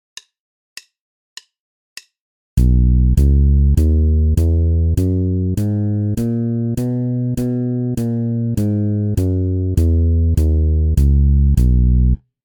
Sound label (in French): Gamme majeure de Si; exercices